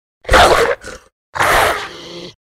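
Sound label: short werewolf attacks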